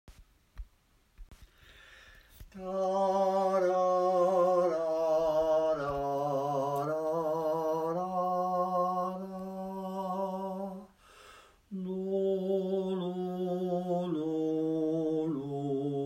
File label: Altos Basses, chorale warmup